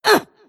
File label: attack small; snapfire